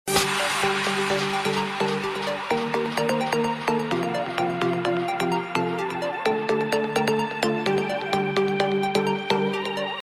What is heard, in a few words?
electric piano bell